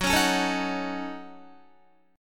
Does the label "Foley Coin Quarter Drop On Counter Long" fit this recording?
No